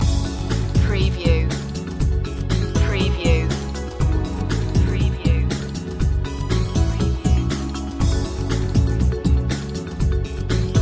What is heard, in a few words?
electric dreamland loop